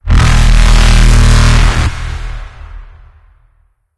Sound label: Terror Blast